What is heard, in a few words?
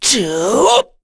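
Vox Casting, Korean; Kain; kingsraid; voices heroes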